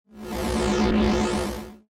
Science Fiction Sci Fi Electronic Space Ship Warble